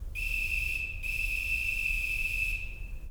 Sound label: police whistle